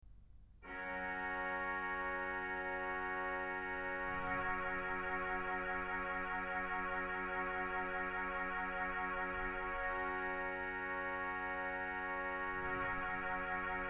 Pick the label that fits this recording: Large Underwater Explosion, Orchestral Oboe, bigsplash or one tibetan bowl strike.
Orchestral Oboe